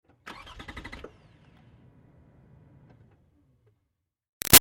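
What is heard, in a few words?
Car Highlander Outboard False Start; 2005 Toyota Highlander V6; Cars; Vehicles; Big Room Sound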